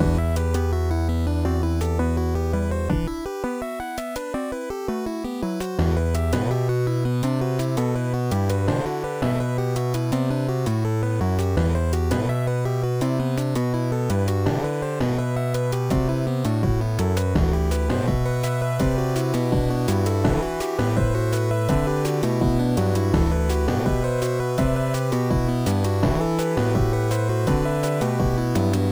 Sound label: Title Screen and Game Over; Ankh